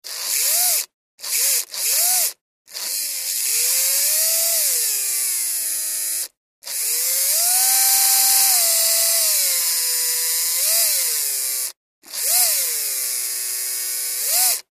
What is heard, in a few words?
Electric Motor Revs